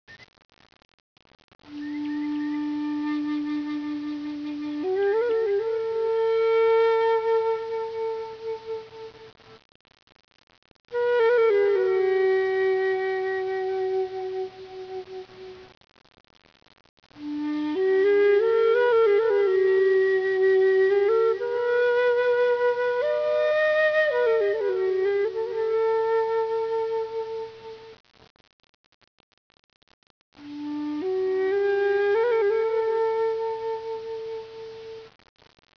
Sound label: theme, ambience; cstrike